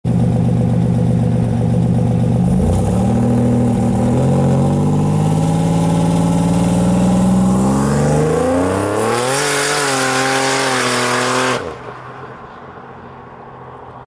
Buick

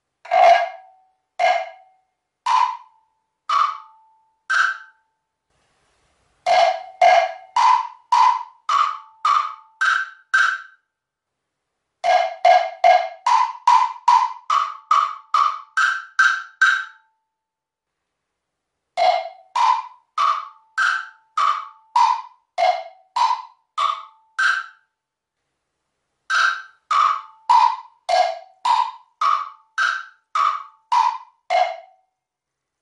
temple blocks

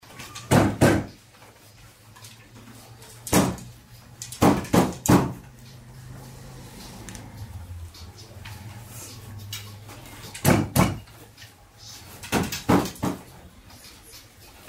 carpet weaving; قالی بافی

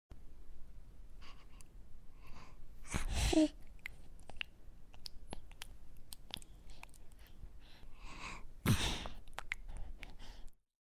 Baby yawning

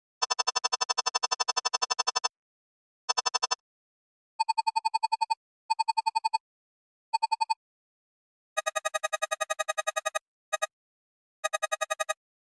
Digital text

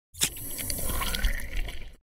ampoule, آمپول زدن

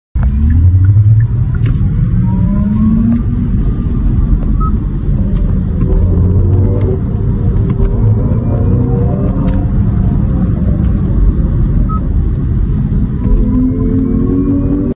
electric car

